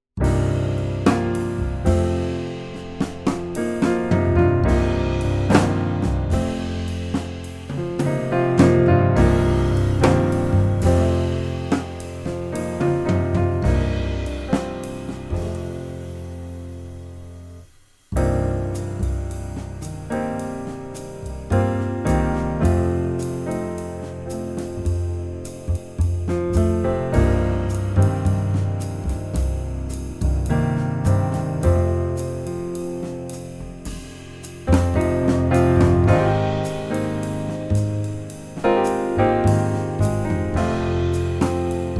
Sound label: C Minor Backing Track